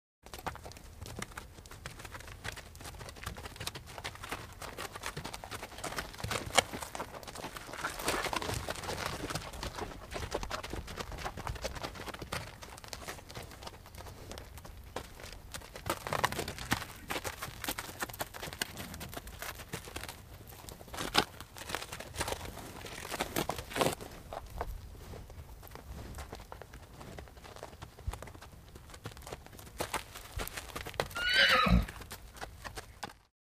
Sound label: horse walks on the ground